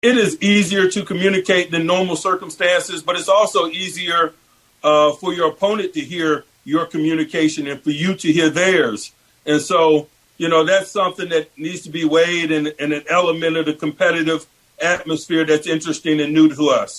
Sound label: CROWD NOISE; MIKE TOMLIN